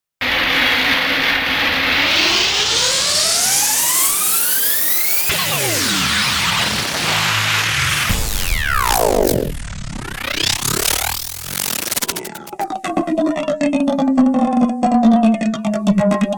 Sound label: twisted transitions